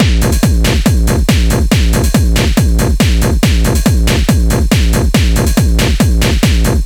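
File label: Hard Style Beat; Ударные в стиле Hardstyle: HS Beat C (Drum Loop); Drums; Барабаны; Биты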